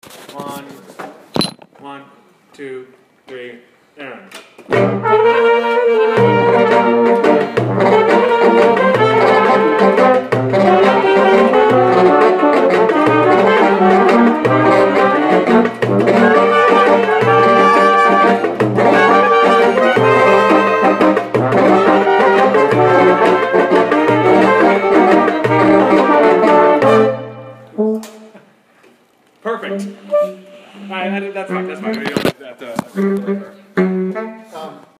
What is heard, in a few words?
Seattle Cocek End, rehearsal